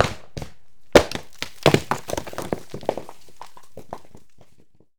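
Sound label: rock slide; RockRip Blender; SFX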